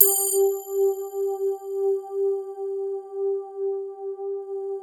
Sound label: crystal pad; Sound Gallery - Charged